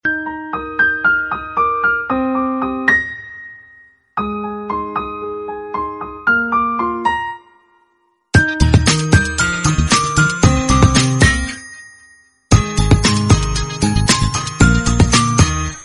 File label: very soft background music tone; nokia ringtones